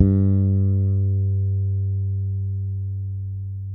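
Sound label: fret finger G, Pedulla bass; Masterbits CLIMAX 7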